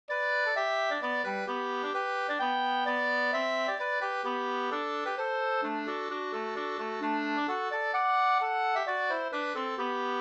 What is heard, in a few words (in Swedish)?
mazurka; e Fredrik Larsson Vanso Leffler47 2st